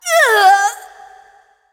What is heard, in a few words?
death; Katarina; zh